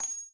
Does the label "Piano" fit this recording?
No